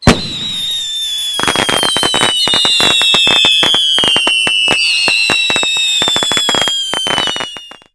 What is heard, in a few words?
CONGRATULATION